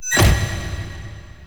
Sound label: Stairs; Warframe; Entrati; Vaults